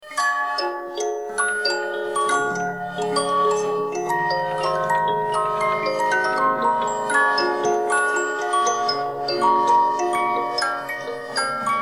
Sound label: Octagonal Organ Box